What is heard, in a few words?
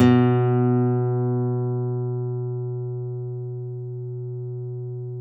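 nylon, FLAMENCO, Guitars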